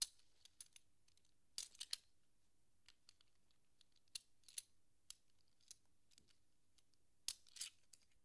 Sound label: Coins Jingle Light Co